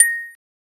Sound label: satisfying pluckor pickso, harvesting